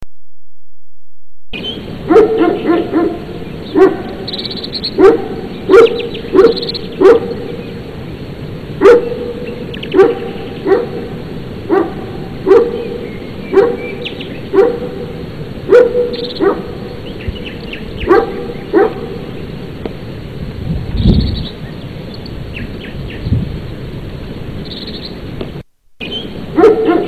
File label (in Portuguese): pastor belg